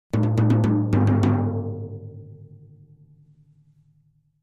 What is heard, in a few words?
Drums Toms B Accent